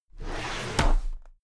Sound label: drawer cabinet paper open heavy